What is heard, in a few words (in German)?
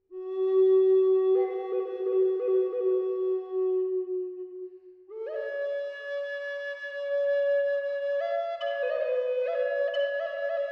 Feldahorn G 432Hz Ast